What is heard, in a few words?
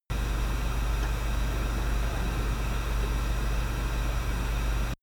harddrive hum